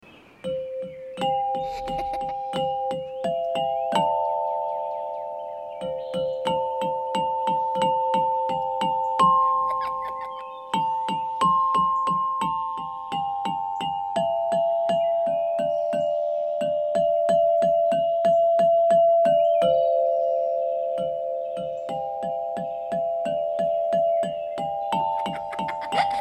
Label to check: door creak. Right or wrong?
Wrong